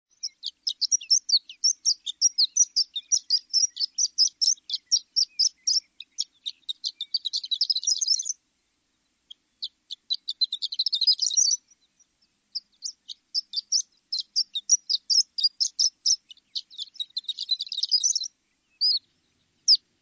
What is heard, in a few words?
Horned Lark